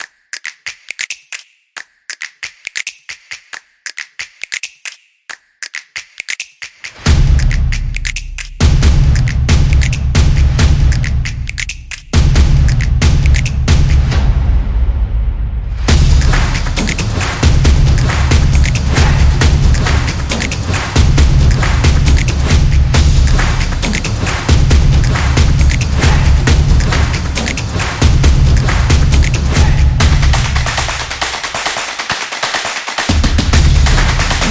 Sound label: Snaps; stomps and claps